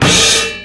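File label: Choke Cymbal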